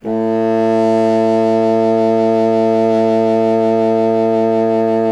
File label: C. Bassoon, solo instruments